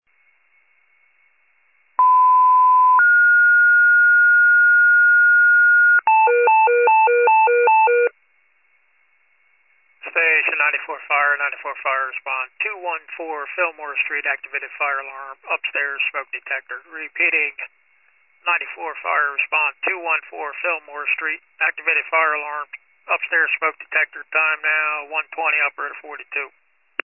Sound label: FIRE pager alert; 94 FIRE